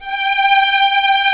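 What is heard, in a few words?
strings, 8pc, vibrato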